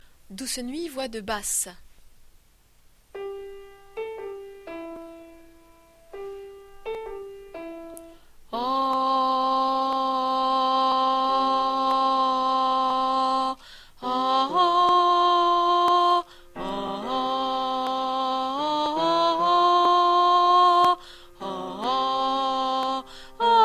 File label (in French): Basse, voix par voix Noel; Douce Nuit